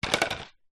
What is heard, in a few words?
household water sprayer bottle plastic small knock over garage floor some room; reverb